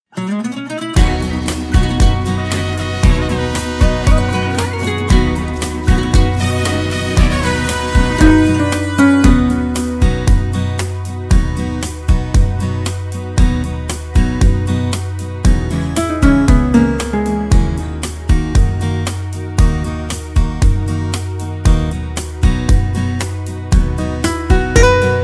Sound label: Karaoke Song